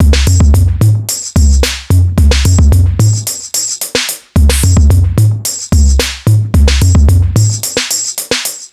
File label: more hip 110bpm